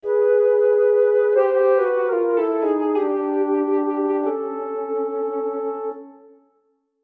flute

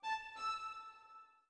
orbit violin; Sky Children of the Light gamerip

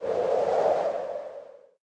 Wind; The Denpa Men; Android

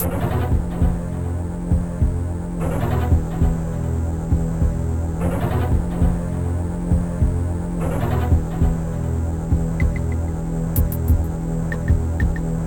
Soul Tomb Ambient; Perihelion The Prophecy; Amiga

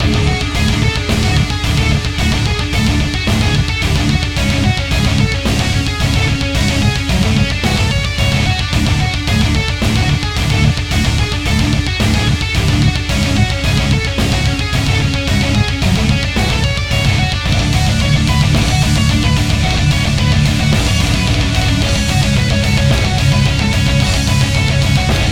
Underground Boss Loop

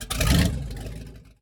Boat Ignition

